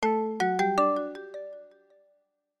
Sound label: huawei notify tune